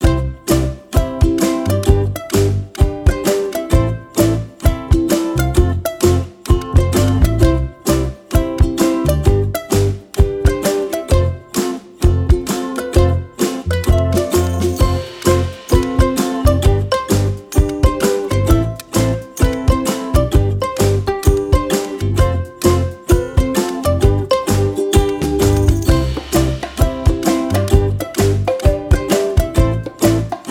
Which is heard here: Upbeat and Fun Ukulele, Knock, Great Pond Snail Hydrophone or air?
Upbeat and Fun Ukulele